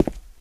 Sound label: tile, player footsteps survivor walk